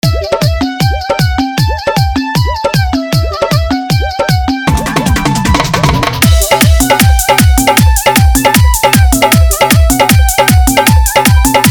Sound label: Bhojpuri Chhath Geet Dhollki Bass